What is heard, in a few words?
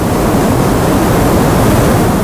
jetpack, sci-fi; freepixel